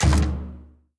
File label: spin button click; netent; kingof3kingdoms